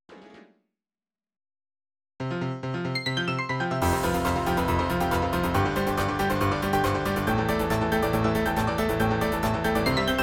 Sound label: Black MIDI nokia 1208